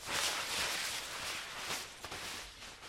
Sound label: foley jacket